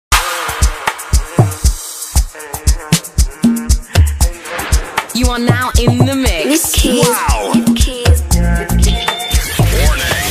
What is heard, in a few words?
Amapiano Mix